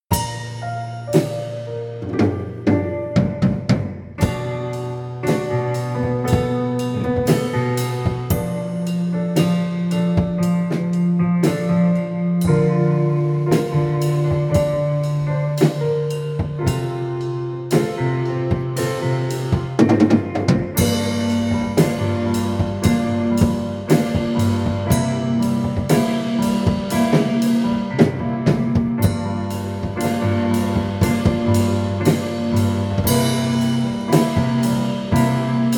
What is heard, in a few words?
Oooh instrumental